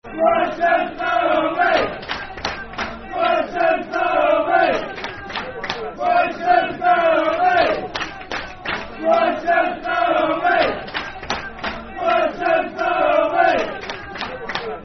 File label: Black And White Army, Football Chant (Soccer Songs), Fulham; Roy Hodgson's Black And White Army; Fulham Ffc